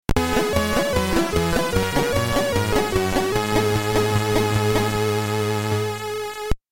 area completed; MiG-29 Soviet Fighter; Commodore 64

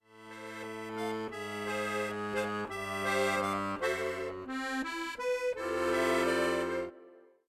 Accordion Pratice; legend of zelda the breath of the wild the champions ballad

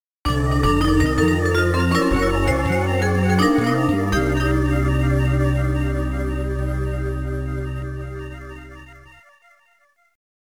Logo; Super Fighting Robot; arcade

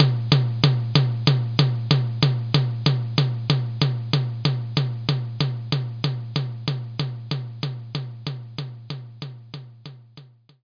mid tom

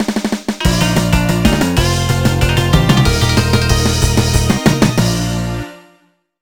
BGM Opening; Sam Power Footballer; NDS

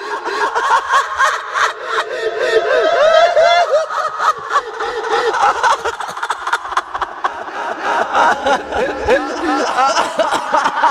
man laughing (ghost fx); HellSign

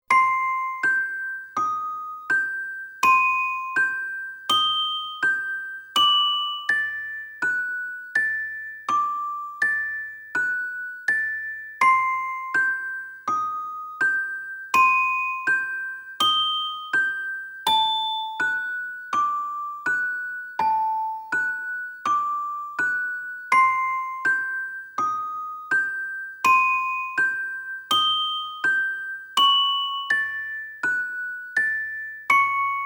Music Box

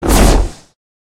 swing; criminality

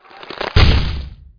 big punch; hero grawl

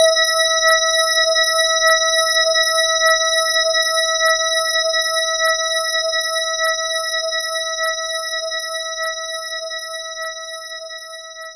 Bell Organ; knights try

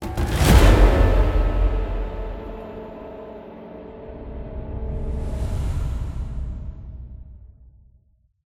Game over

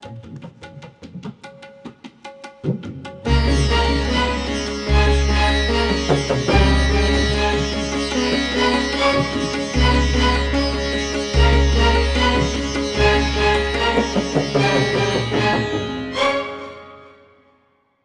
Tuba

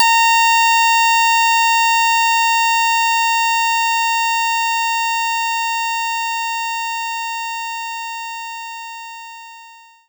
E Piano; knights try

